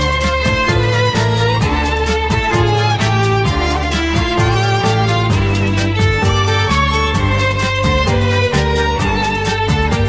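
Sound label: Classical Mix Carmen Ringtone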